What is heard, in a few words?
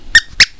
skweeker